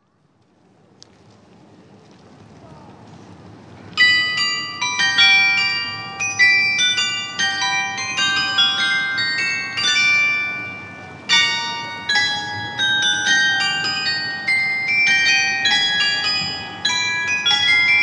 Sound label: carillon